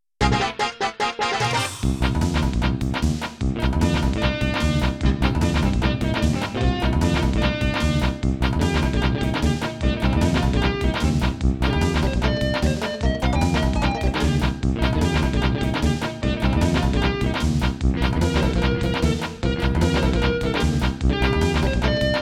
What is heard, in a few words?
Hip Hop Filler